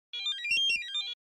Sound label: pager alarm, beeps